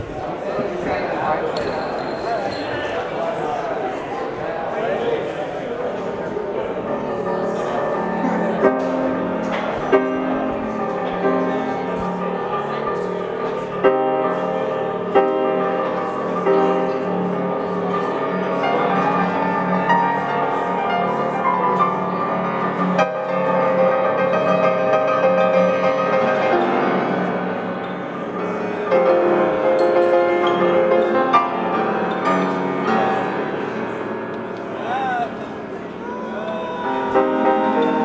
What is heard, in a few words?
unknown piano + electronics; Pdx NoiseFest 2005